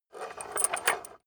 metal scrape on wood rattle

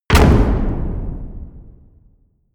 hit wood, hits impacts

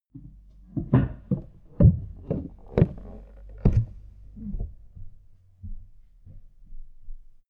step up on the attic; schritte

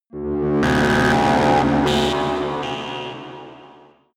sci-fi cosmic strong energy; horror

